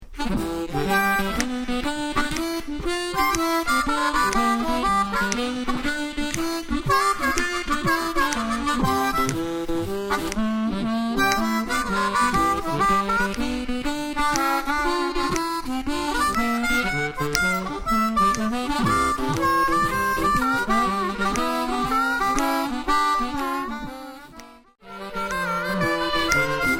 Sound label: Harpin On A Riff